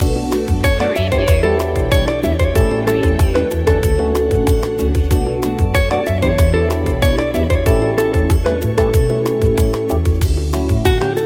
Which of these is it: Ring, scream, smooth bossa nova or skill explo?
smooth bossa nova